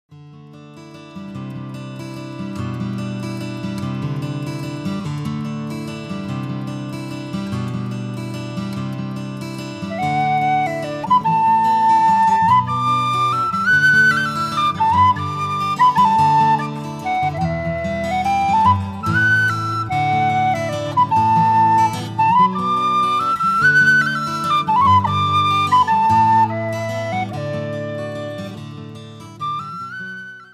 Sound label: Instrumental